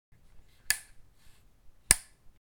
Sniper rifle foley putting safety off

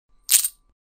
Game coin pick up

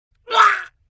Death scream man dying pain

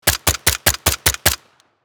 Slow firing machine gun short burst

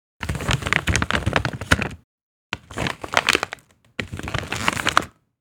Plastic bag handling and folding; gfxsounds

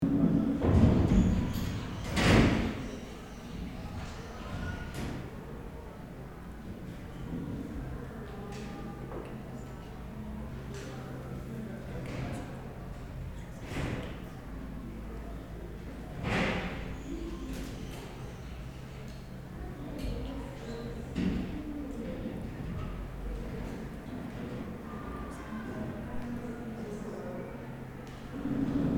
School ambience empty classroom tone loop

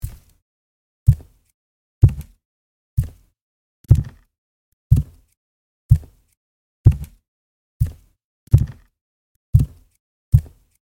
Footsteps on wooden floor barefoot walk

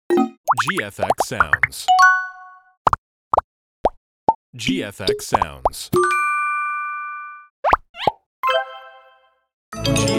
motion fx pack

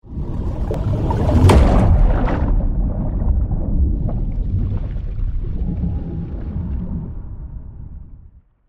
Naval underwater missile launch shot; ship

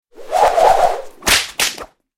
Whip whoosh and crack impact

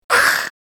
Vampire pain hit scream reaction

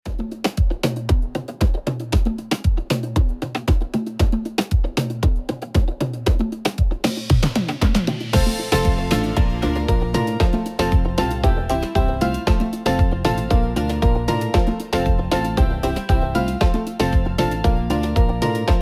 Tropical beat summer vibes

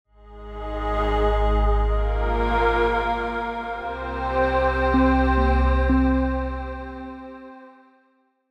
Video game success victory win sci fi

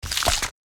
Light skin cut and tear; gore

